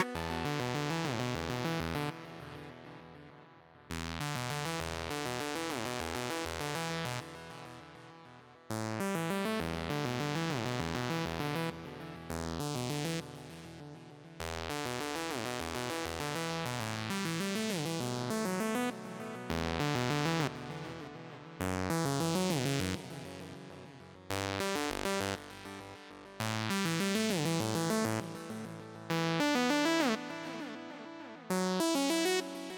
Mix Stem Layer; Quantum Recharged

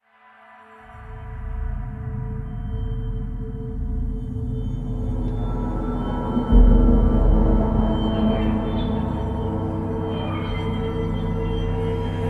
Nocturnal Tension; Mad Max

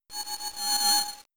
Hypnosis

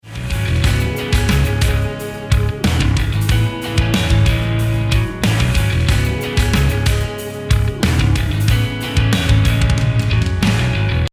start round

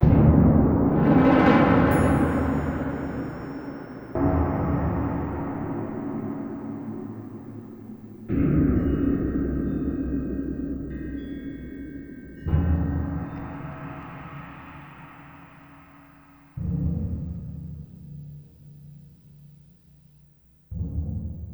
Scary Short; Hitman Blood Money

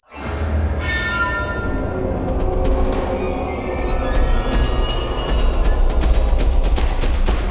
Action START; bolt 2008 pc gamerip

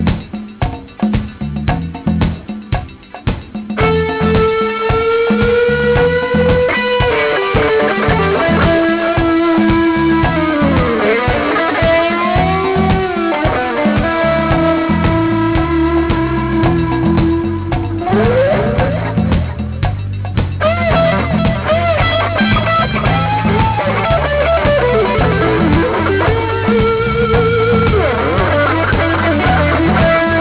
Boombox Loop; Bratz Super Babyz; NDS

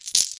Coins; super trench attack 2

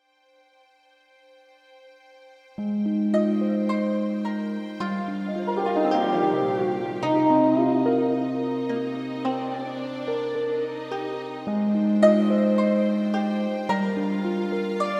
Scene Music; Age of Wushu; Windows

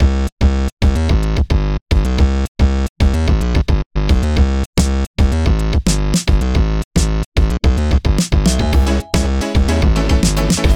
Instrumental; ourple guy garten of banban mix; windows

